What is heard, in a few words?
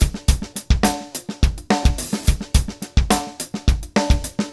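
Latin Jazz Busy Drums, 106 bpm; Pro Tools Essential Loops; Digidesign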